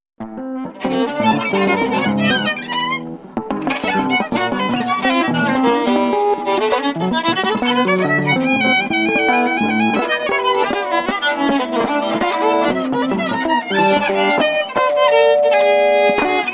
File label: violin guitar